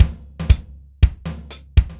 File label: BPM120 Dry, dryLoop